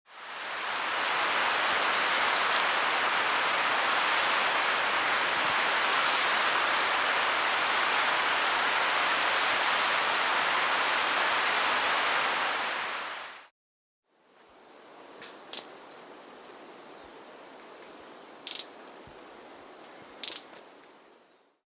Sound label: Bronze-Olive Pygmy-Tyrant, Nature's Songs, S. America